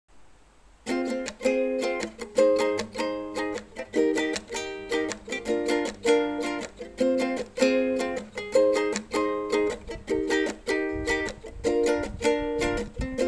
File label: ukulele